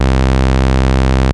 Analogue hits and octaves

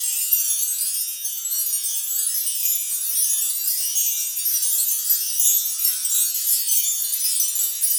Chimes Constant, Wind Chimes; Sonic Foundry (Sony Creative Software); Joe Vitale Latin Percussion

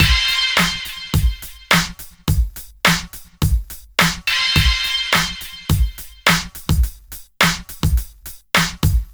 Club Tip, R&B Drums

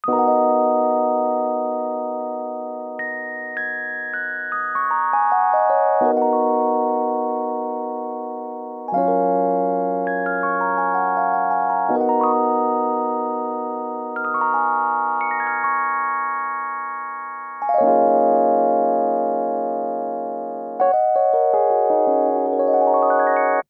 rhodes, Smooth RnB, Ebmin; Big Fish Audio; Legendary; Happiness

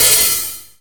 hihat A#5; Greytsounds VOL-1; PROCESSDRUMS

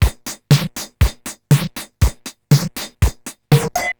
Distorted TR 505 (120BPM), beats

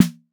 snare organic; snares

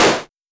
Simmons Clap; Drums

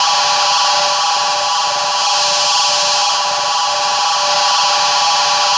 CRANKSHAFT; Distored Reality

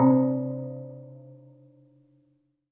C3, Synth Line; 90 s Sample CDs; NiceBeats; SynthLine VOL-32

